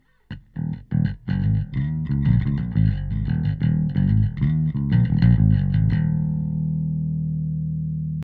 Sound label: bass Aggressive Bass; SplitEQ